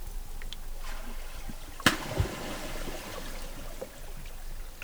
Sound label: Acipenser oxyrhynchus, sturgeon jump; kennebec; augusta; soniferous